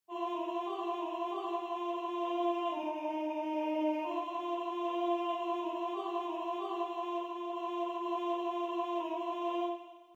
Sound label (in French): voix